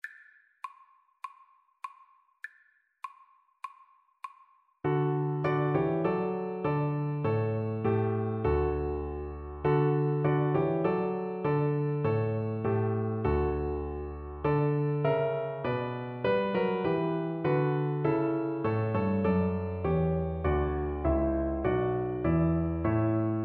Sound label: Cello